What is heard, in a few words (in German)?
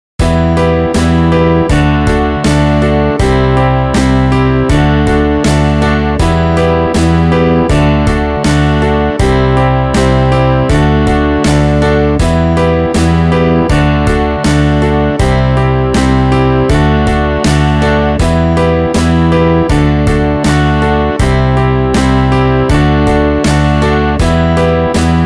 80 bpm komplette band